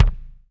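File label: BD, bass drum; Best Service Gigapack 2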